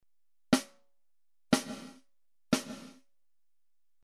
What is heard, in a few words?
Nonlin Snare